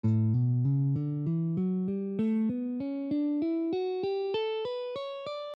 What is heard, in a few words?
A Mixolydian